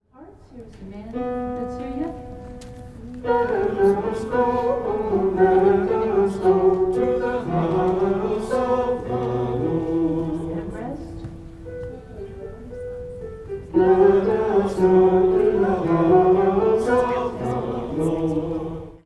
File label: refrain men; Gather Hymnal